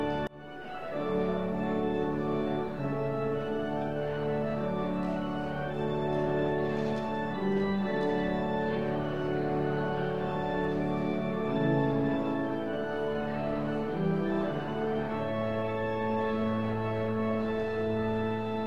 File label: Trumpet Tune